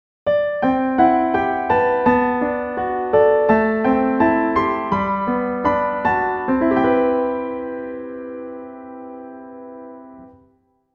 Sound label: Jingle (piano); Kissbell; Smile Kiss